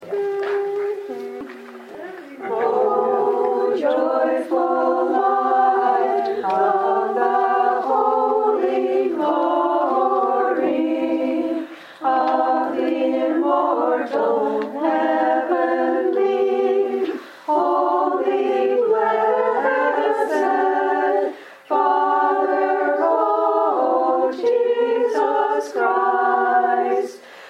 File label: o joyful light soprano and alto rehearsal